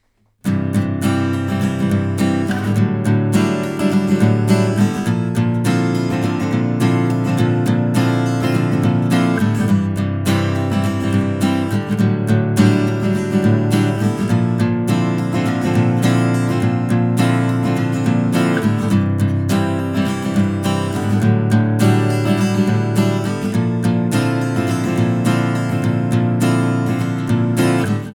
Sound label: akg c2000